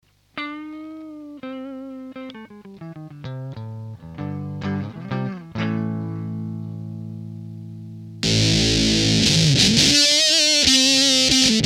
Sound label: Custom H3F Super Fuzz; circuit demos